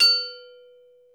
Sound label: BELL B2, TIBETAN BELLS; Crypton - DrumBase5000